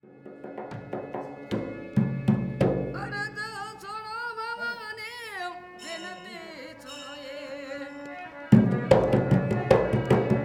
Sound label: Harmonium and Drum at the Karni Mata Temple Deshnoke; Samuel Corwin; Selected Field Recordings from India and Nepal